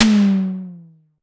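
Electro Tom Hi Down, Drum Machines Clavia DDrum-AT Pitch Envelope; Pitch Env Various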